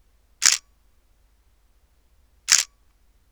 Nikon D7000 normal

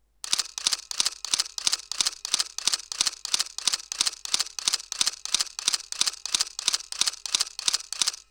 D60 continous JPEG; Nikon

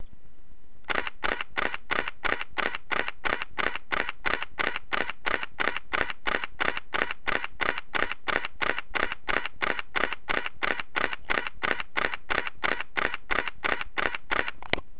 low, Canon EOS-30D

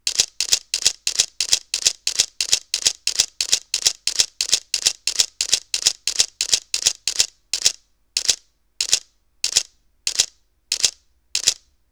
A900 continous low RAW, Sony Alpha DSLR

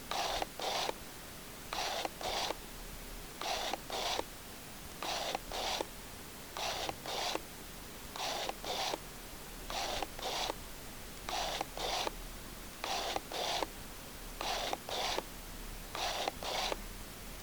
canon af; Canon 5D Mk III fázis AF állításának hangja; élőkép módban

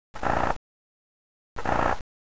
sensor cleaning; Pentax K20D

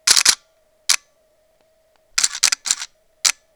D300s quiet mode LiveView; Nikon

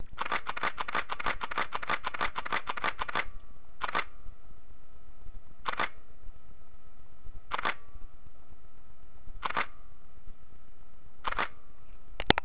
raw burst; Samsung GX-10S

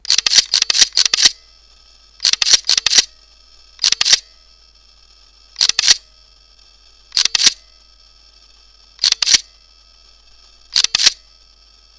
K-m continous hi RAW; Pentax

